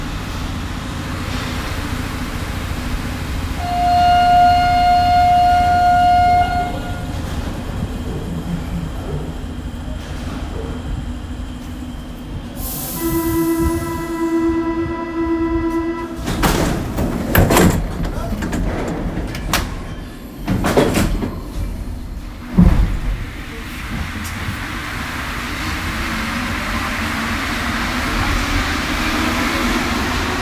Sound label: metro